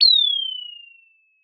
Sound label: Sound of an owl